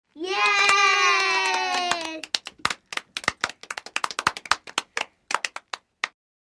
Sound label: Young Kids Clap Cheer